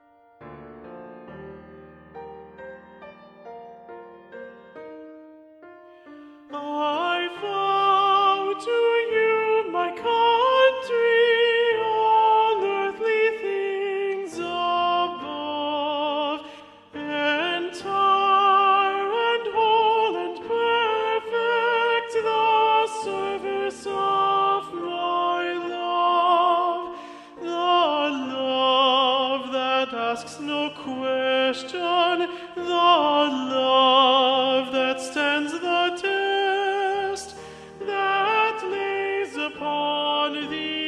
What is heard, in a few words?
alto predominant